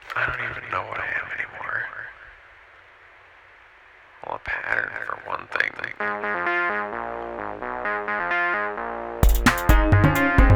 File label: bumbling